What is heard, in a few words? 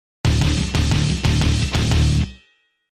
hammerhead transition